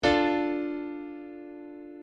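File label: piano chord D minor; circle of fifths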